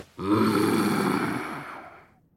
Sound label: mmgggh; Hifumi